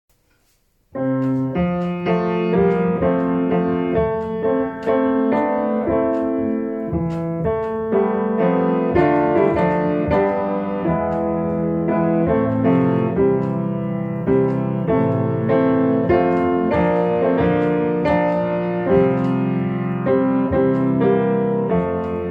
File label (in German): Klavier